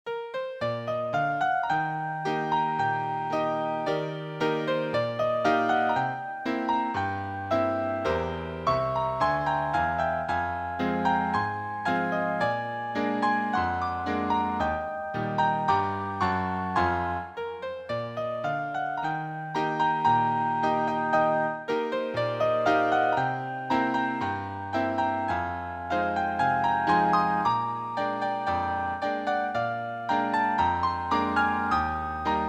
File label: dulce serenade - a ragtime companion